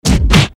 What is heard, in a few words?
fist hit seq